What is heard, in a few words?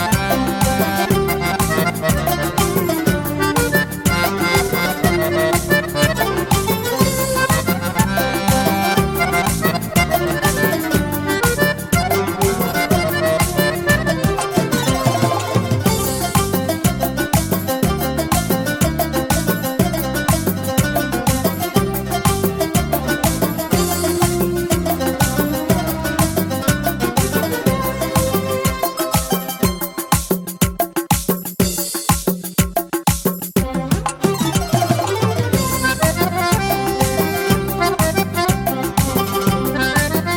Accordion